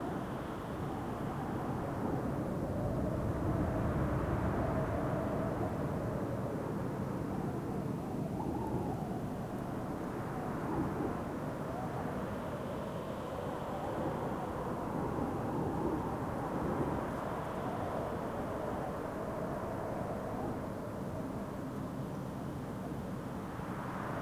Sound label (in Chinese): 荒原 音效; 背景音效; 山岚巨人; 2021西路